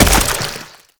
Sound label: Land Sludge, Footsteps; Warframe; Tenno